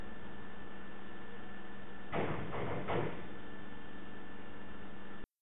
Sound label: Distinct Knocking